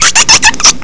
rat; creepwampz ya

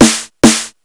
beat, party

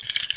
ammo, items

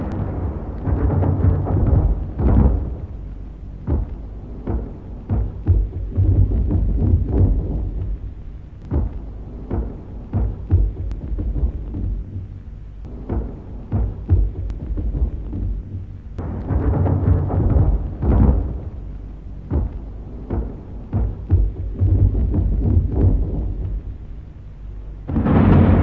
guns flak ambience; dod; guns medicinal

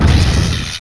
shock fire; svencoop; cryocell

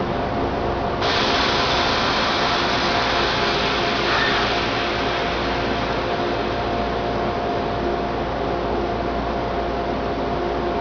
sub hiss; cstrike; es quam

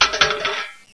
metal; svencoop; aomsounds

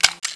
deagle mag out, weapons; svencoop

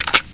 Sten clip out, weapons; dod

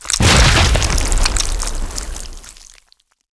bile bomb hit; weapons

